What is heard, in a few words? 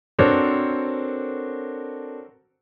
dissonance